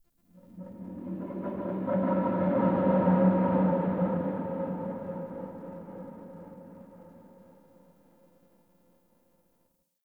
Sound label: Wind Blow; Warframe; Tau